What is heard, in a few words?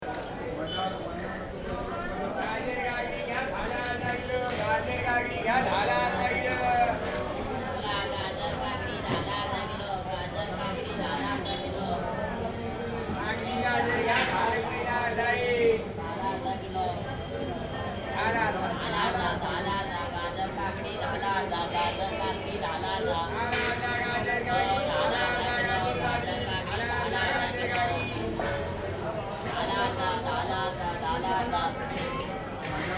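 marche, Inde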